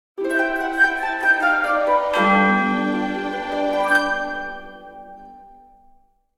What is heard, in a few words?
feature Splash Start; jingle spin